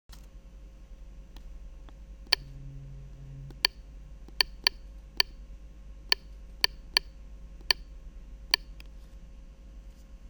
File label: Click, Siemens CF75; tests